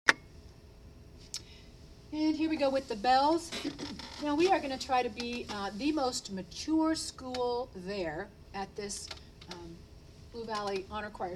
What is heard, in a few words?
The Bells ALTO